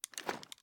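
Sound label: pistol reload fast mags large raise; gmod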